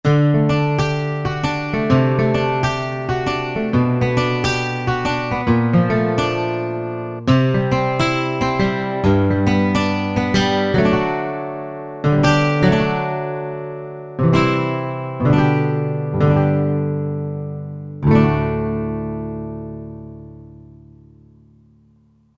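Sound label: Acoustic Guitars; Country; Roland SR-JV80-17 Country Collection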